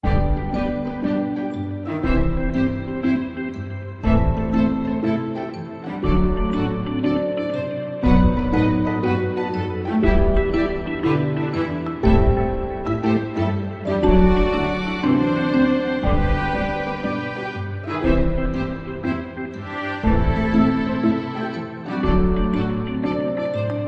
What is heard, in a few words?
Chirpy Ambient